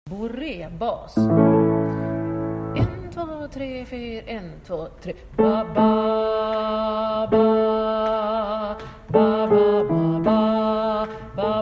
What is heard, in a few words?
Bourre baryton